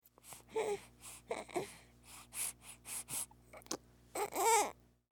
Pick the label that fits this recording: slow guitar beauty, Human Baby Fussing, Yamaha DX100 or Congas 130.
Human Baby Fussing